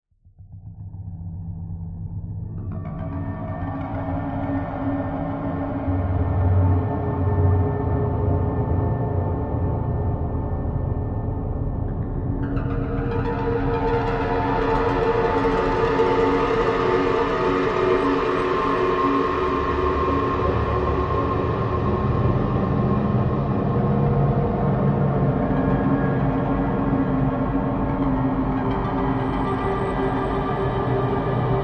horror drone atmos evolving dark evil sinister tense synth arpeggio elements